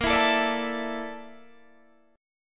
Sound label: A sharp Major 7th Flat 5th, Guitar Standard; strummed; JGuitar